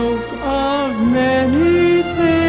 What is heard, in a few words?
female laugh